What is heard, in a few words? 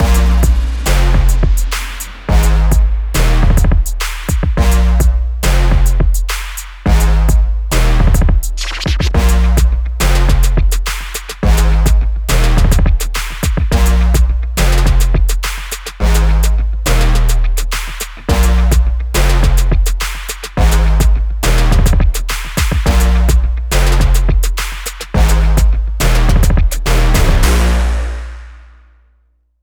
Hip Hop Bed